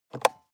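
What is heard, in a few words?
sports car interior compartment open medium; verkehr transport; autos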